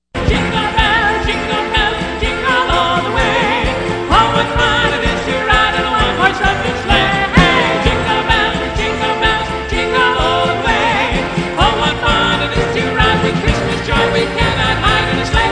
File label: Jingle Bells